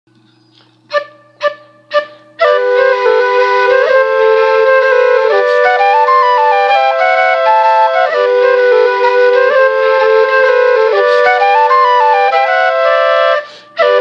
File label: polska; fran andes lang ante